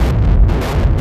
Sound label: FUZZFACE, arpeggios; ILIO; Trance Fusion